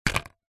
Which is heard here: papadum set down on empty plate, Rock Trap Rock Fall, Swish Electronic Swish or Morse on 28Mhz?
papadum set down on empty plate